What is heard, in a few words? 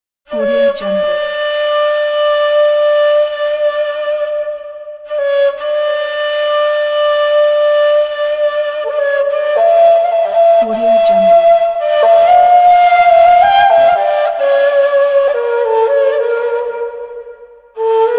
turkish ney; thepilotmusic